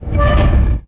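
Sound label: Collision Interior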